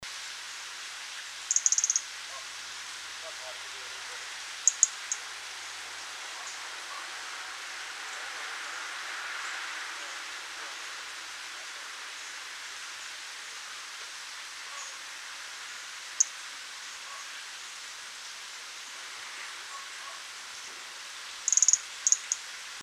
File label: Hodgsons Redstart Phoenicurus hodgsoni, call